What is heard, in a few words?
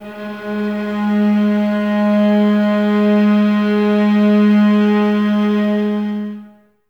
STR VAS G#, String Ensembles, Violas, No Vib; Miroslav Vitous